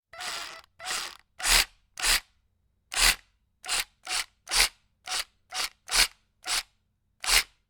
small reciprocating saw burst

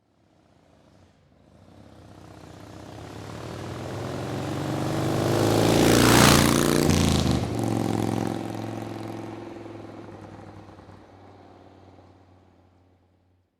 motorcycle drive by

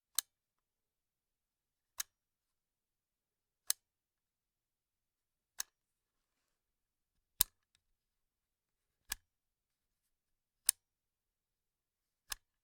rifle fire select

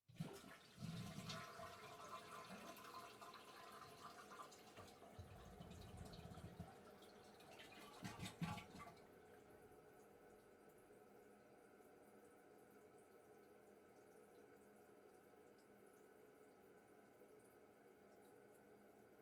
toliet flush

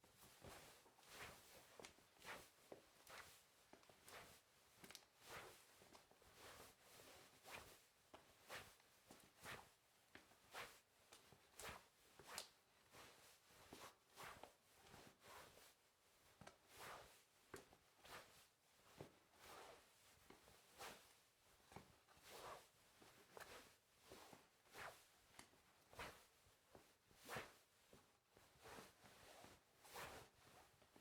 pants whooshing

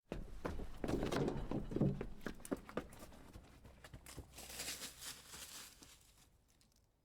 running into the woods

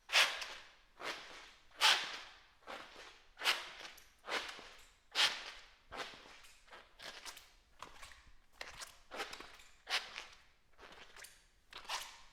rubber shoe walking squeal

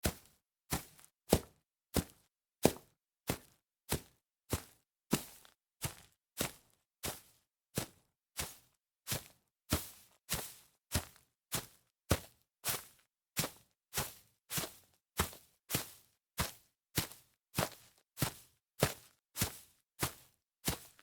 walking on grass

